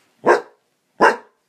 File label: woof woof, kerry blue terrier